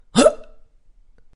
hurt; cof; simon

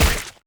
atk punch hit; roblox; coolkidd; slender

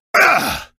hurt; zombiesurvival; medieval raid; arkantos

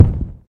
footstep; slender; zerosiki; phonophobia

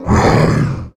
attack, male zombie; nmrih; zerosiki; slender

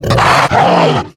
death, male zombie; nmrih; zerosiki; slender

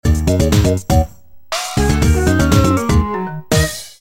funky moves, music minigame; tf2ware ultimate

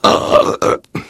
pain sharp

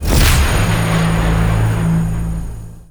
spawn sound; chiabihamaru; cv project; invading executioner; zerosiki; slender

